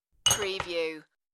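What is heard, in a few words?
Stack Glass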